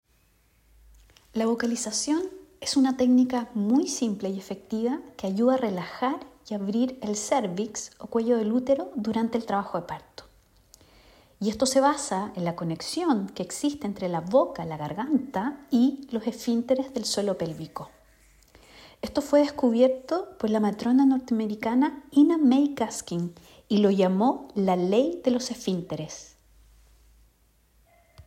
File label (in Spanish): vocalizacion